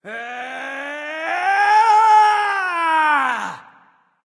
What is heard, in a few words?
battle cry; smite; loki